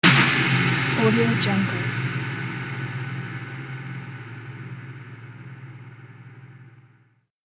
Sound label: reverb hit